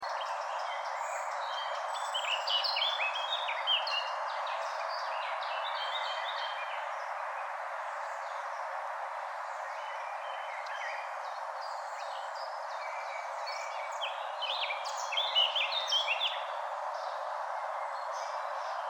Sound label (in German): mönchsgrasmücke; Mag. Peter Holub